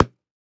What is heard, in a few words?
Impact Wood Hit Body